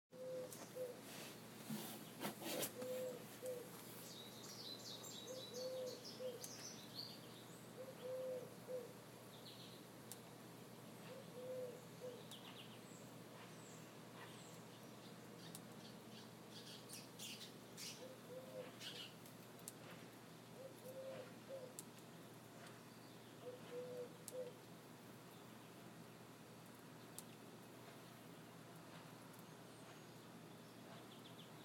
nature sounds birds and wasps and braille displays